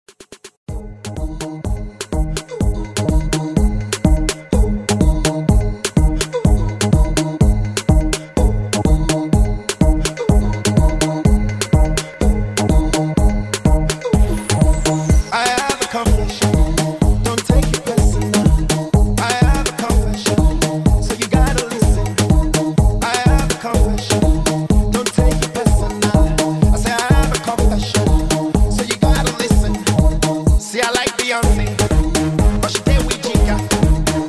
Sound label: Afrobeat Redrum